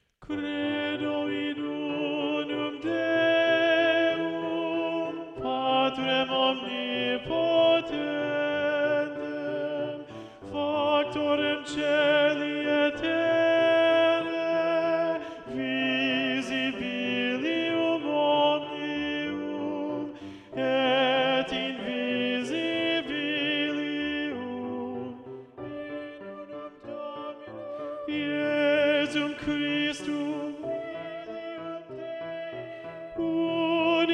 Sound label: Tenor; Mass No2 in G Mvt 3 Credo; Practice Room